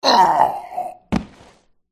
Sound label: mage death